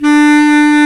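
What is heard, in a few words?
Bass Clarinet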